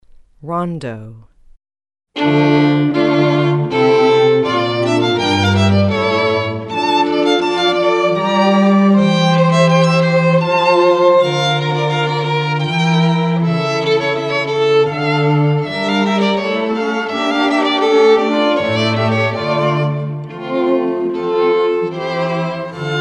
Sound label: rondo, string quartet